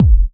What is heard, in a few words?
Kick, Kickdrum; Equipped Music; Loungin House